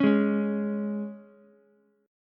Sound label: Db 5th, Ukulele; strummed; 6,8,9,x; JGuitar